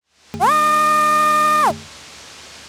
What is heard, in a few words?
feed one blank label 6ips, printer